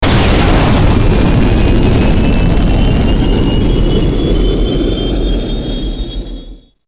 Powerup Spitfire

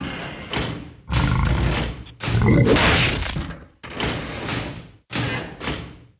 Golem attack; Puzzle Chronicles; PS3; PSP; X360; SFX 3D Golem Spattack

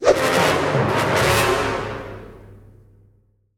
Ui Defeated

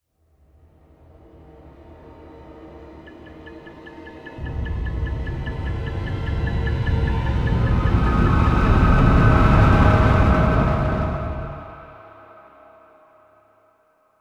Transition In; Battlefield 1

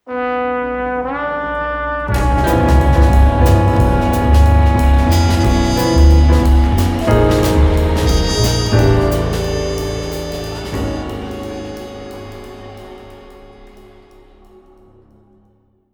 Round 3 Start; Black Ops III Shadows of Evil; PS4 Windows Xbox 360 Xbox One

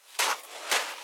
Footsteps Sand; Idle Champions of the Forgotten Realms

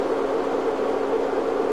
Projector Loop

